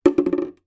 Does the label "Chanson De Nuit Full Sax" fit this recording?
No